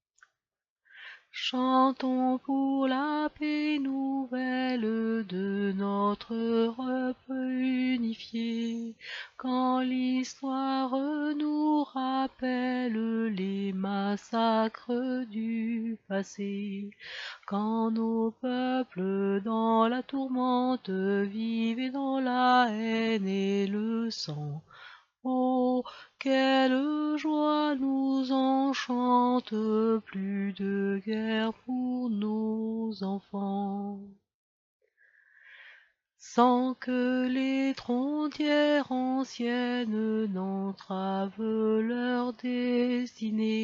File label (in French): hymne à la joie chanté